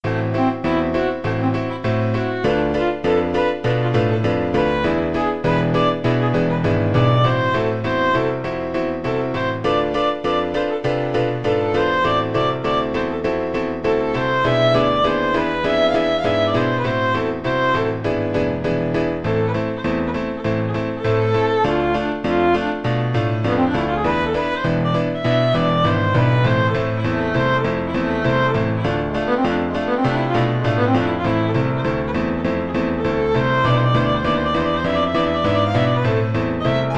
stomp, violin

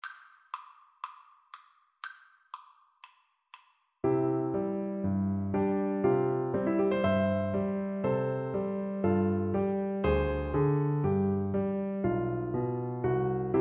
reed pipes, Flute

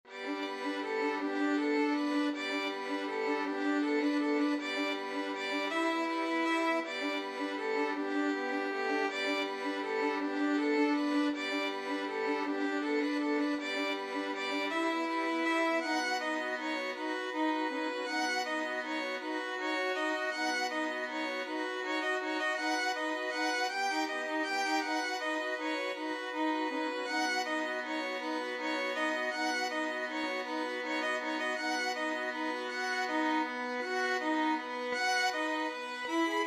morning, violin trio; grieg

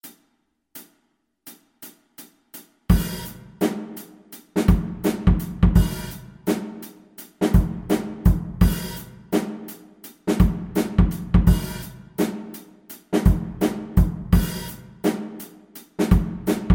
Funk Study, saxophone

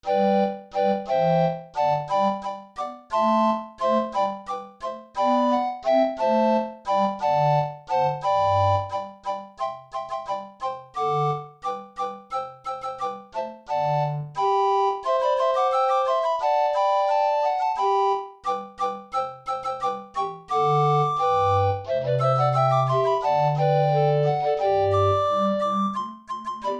recorder quartet

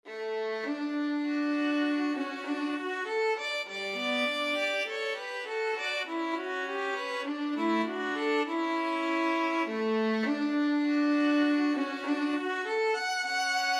violin viola duet

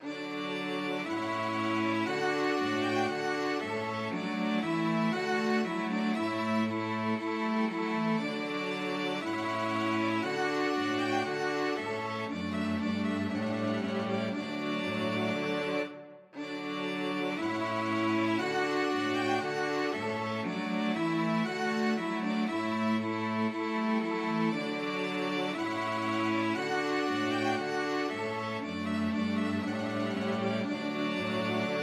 trumpet voluntary STRQ, string quartet

